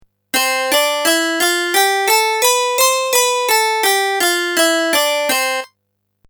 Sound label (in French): Gamme Zarlino